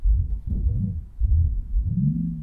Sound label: Multi Woom, chairmat; Micro Pack